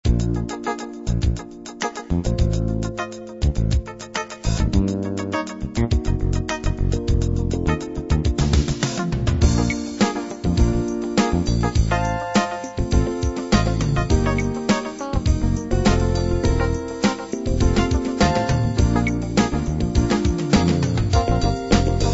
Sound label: soul